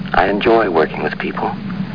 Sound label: people